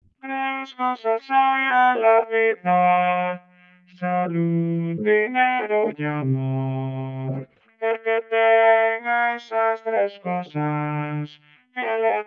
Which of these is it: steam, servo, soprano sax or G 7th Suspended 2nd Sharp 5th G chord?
soprano sax